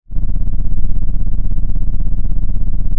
pop, Sound of an engine revving

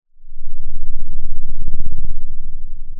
Sound of a thunderstorm, center

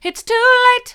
it's too late mid, vocal

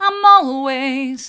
i'm allways hard, vocal

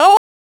fear strong; VIVAE

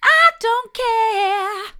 i don't care soft, vocal

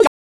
achievement moderate; VIVAE

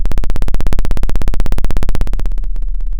Sound of a plane taking off

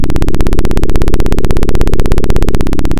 Sound of a plane taking off

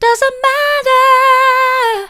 doesn't matter soft, vocal

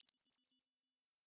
Sound of a bird tweeting nicely

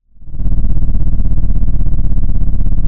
Sound of an engine revving

